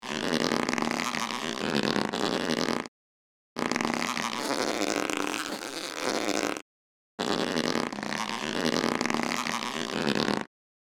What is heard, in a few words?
Rubber stretch and stress